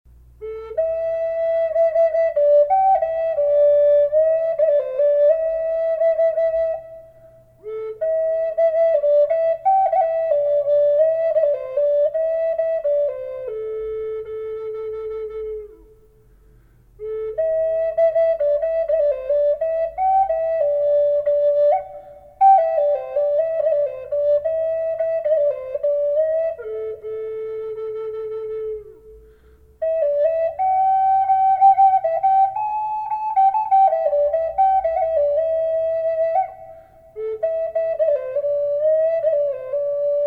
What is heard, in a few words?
kauri A minor reverb, flute